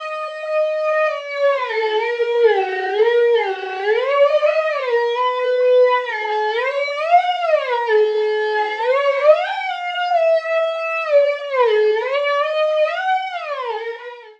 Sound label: weird song; Zero-G Ambiosis